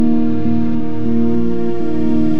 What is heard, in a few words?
Texture Inphase 100bpm; synth loops